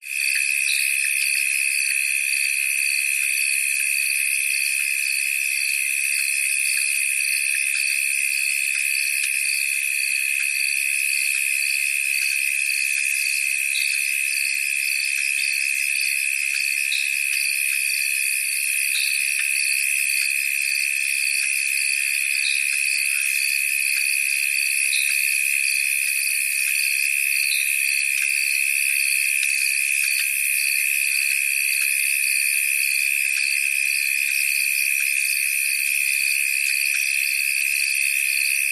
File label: animals insects frogs slight distance evening sub tropical australia